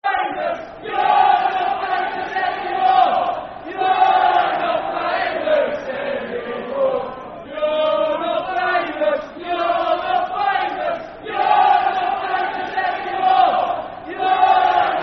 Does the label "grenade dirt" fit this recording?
No